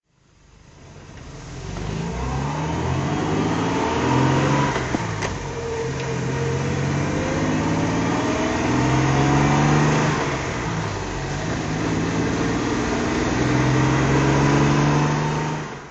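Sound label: Jaguar mk2 manual drive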